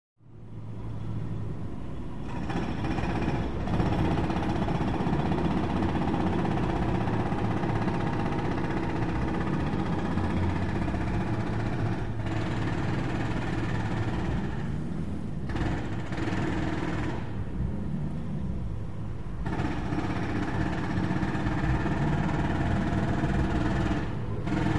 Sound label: Jack Hammer, Interior Closed Window Point Of View Medium Distant. Long Steady With A Few Short Bursts.